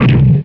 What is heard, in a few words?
door end